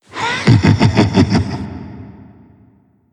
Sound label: laugh; slender; meph